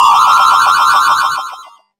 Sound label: werewolf howl; More Creatures